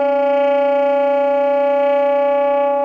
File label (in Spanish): Tremolos